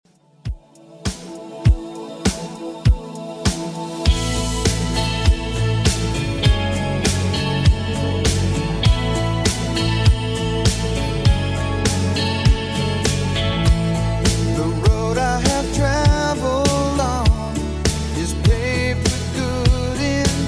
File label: 90s Redrum 100bpm